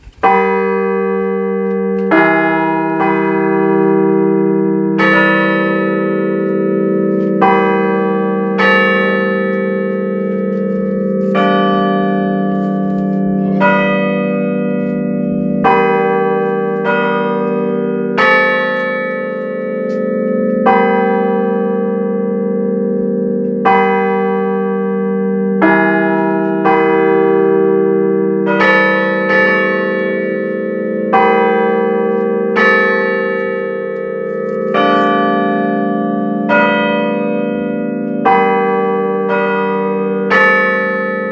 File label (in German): Glockenexperiment; St. Augustin